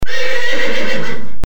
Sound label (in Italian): cavallo, versi degli animali; animalandia